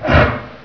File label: alert; source engine; hl1; agrunt; video games